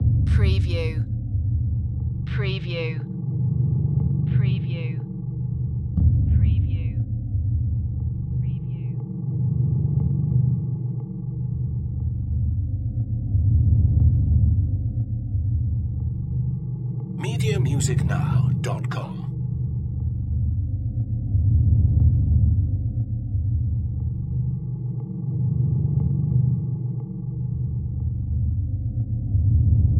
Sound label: Hell Bass